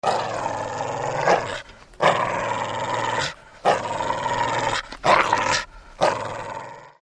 Pit Bull Dog Alarm

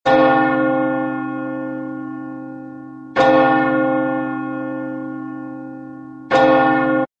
bells bell church low ring

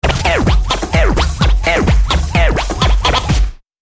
D Jay Rap Djay DJ Alert

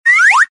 whistle slide up

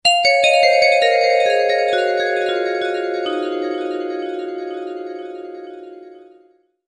Magic Alert

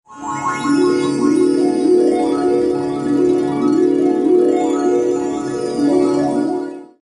Sweet Wake Up Alarm Peaceful Wake Up

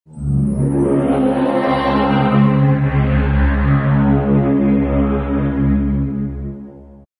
chord low ethereal; fantasy

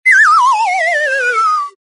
comedy whistle warble down

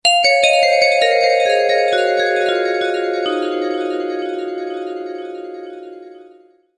White Magic Alert

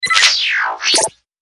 Robot Space Alert; R2D2; Star Wars

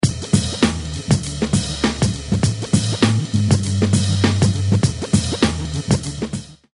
flip tha zip scratch

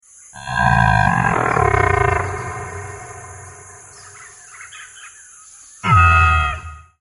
shrieking afrovenator

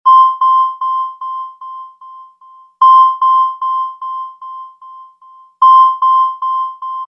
electronic pinging signal

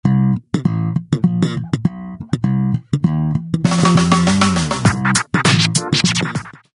slap bass riddim scratch

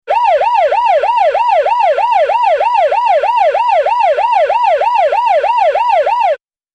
Warble Siren Alarm

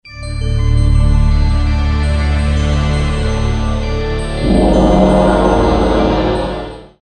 Drama Alarm

